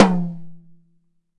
high floor tom one shot f key, Royality free tom single hit tuned to the F note; tom drum samples; one shots